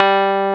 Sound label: Keyboards Old School, G2; USB Soundscan vol.09; AKAI